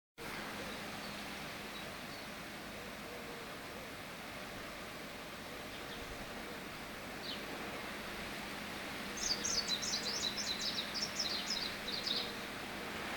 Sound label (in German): gruenlaubsaenger